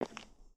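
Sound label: Foot Concrete Sneaker Walk, footsteps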